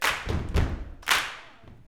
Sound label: CLAP FEET